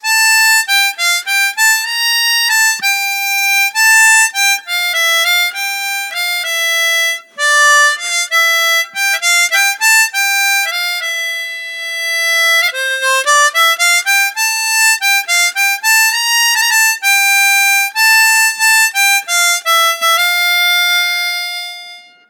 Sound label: Hohner Toots Mellow Tone